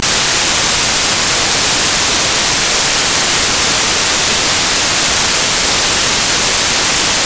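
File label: fanchants, football chants; die ganze kurve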